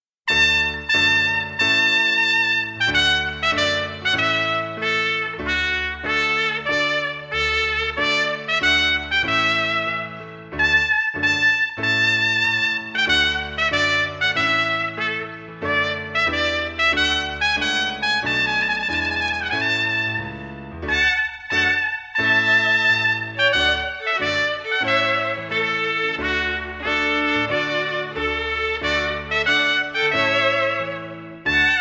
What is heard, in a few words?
Trumpet Tune